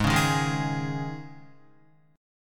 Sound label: Ab Suspended 4th, Guitar; Absus4 strummed; 4,4,1,1,3,3; JGuitar